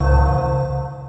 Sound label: warning